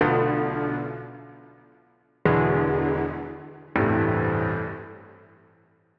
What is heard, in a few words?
Taped 80BPM A min, pianos; 99Sounds; Monosounds; Music Loops; Instrument Loops